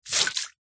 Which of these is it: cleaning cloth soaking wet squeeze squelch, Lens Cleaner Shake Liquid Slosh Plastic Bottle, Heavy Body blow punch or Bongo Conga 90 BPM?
cleaning cloth soaking wet squeeze squelch